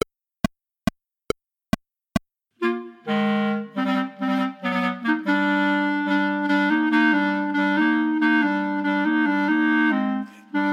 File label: 3 Pieces for 3 Bb Clarinets minus 1st part